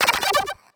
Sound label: Retro FX; Cymatics; Video Game Sample Pack Vol 1